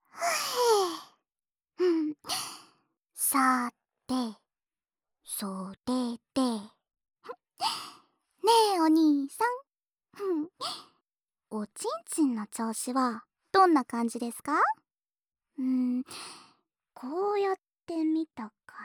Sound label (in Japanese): 左耳の耳舐め＆オナサポ; さぁ……素敵なオナニーを見せてください